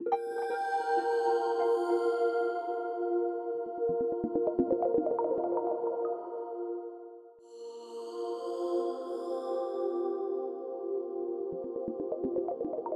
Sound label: boot Sound; blasting agent ultimate edition; wii u